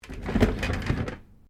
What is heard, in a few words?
Chair office wheeled move; Office furniture; Chairs; Offices